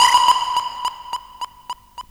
NOISE ECHO; USB Soundscan vol.27

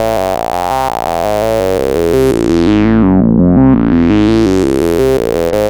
Pure Analog, Keyboards; Sonic Foundry (Sony Creative Software); Leo Cavallo Bass Taster

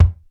BEAT KITS; Crypton - DrumBase5000

